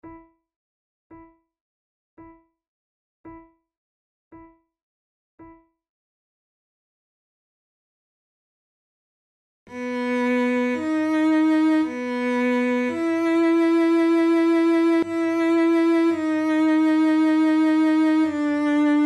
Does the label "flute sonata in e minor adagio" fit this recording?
Yes